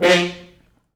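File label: French Horns stops, F2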